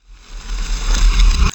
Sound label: REV, SOUND EFFECT REVERSE